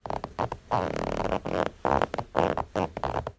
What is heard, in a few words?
household chair sofa squeak creak as person moves around on it